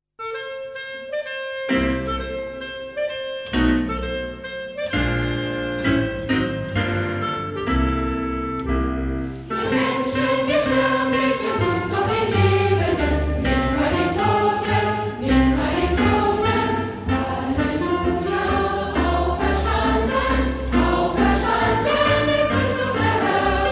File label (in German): gospelchor osterlied